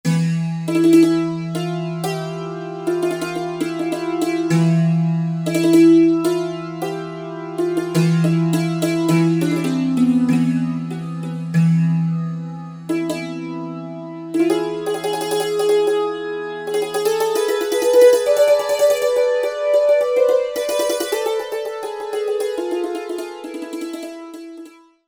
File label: Cimbalom